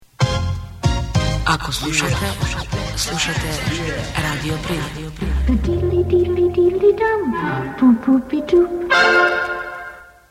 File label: jingle; novinarska fela